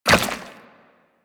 Run Goo, Footsteps; Warframe; Tenno

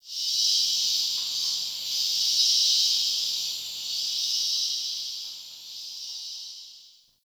Cave Ocean Creature Far, Ambience, Ocean Cave; Midrath Island

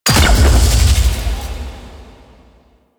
Orphix Shield Arm Break Explosion; Warframe; The New War; Sentient Devourer

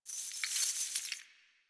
bird three body shake; ambience

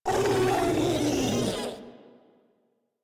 Vocal Attack; Warframe; Proto Infested Ancient

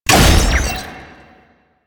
Scan Impact; DE; Warframe; Frumentarius

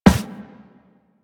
Operator Run Metal, Footsteps; Warframe

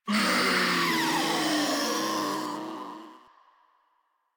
Infested Ancient Death; Warframe; Proto Infested

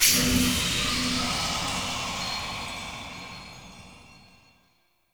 Quest Appear; Orlick; Friendly

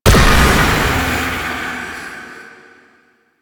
stun burst; Warframe; Stalker

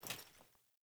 Grunt Foley Movement Armour; Characters; Enemy; Melee Grunt; Sinecure

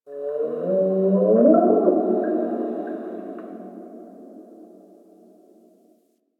Creature

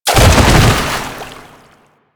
Bodyfall Water; Enemies; Warframe; Proto Infested

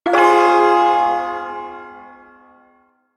Shawzin Phrygian Chord G; Warframe; Duviri Ersatz Shawzin

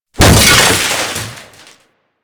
Shipyards Crusher Arm Debris; Ambience; Props; Warframe; Grineer Shipyards

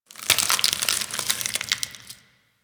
Ice Crackle Rand Amb

